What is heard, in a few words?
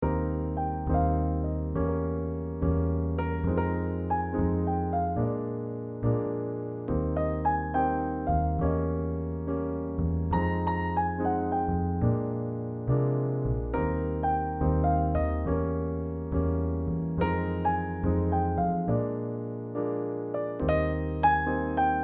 Jingle Blues